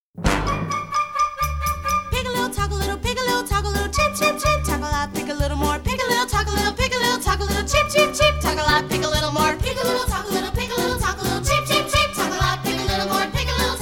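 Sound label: With Vocals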